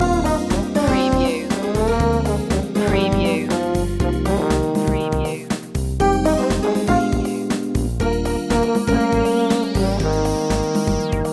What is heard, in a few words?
black lounge loop